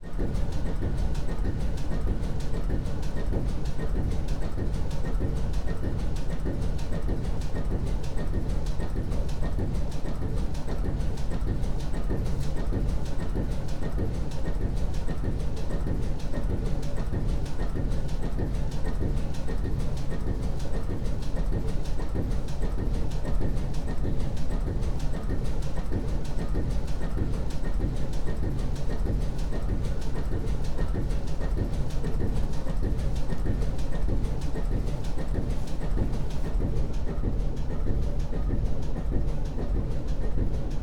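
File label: barge onboard slow drive steady deck wheelhouse, diesel; Holophone; Pole Position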